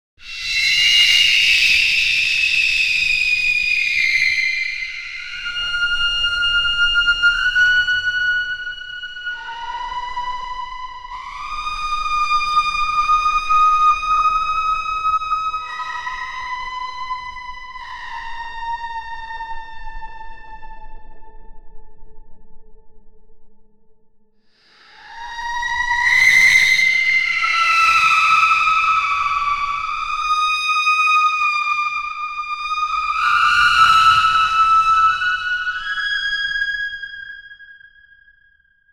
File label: woman scream horror movie